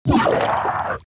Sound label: Boss Spit Blob Barf